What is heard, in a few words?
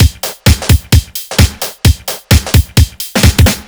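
No Percussion, Drum Loops 130bpm; Zero-G; Soundclash Nu Dance Electro; Acidized Wavs; Chicago Love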